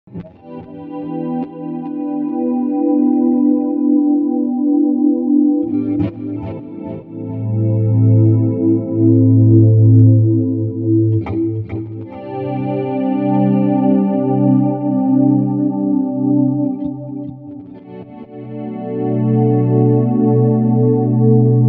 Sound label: Preset Swell